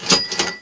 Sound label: cash register ching